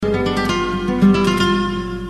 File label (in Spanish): guitar